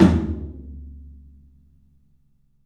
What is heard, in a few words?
TOM, Low Toms, 3D Percussives